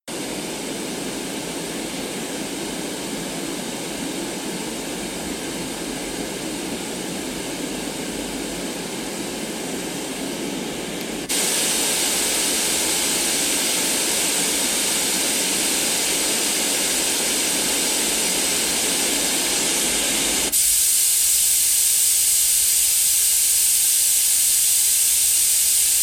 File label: Sleepbox white noises